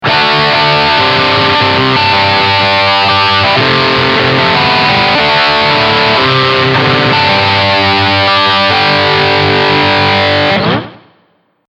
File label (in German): Riff Steg SC